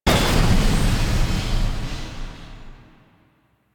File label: Railjack Launch Detach; Warframe; Rail Jack Dock